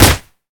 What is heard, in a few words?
uppercut; battle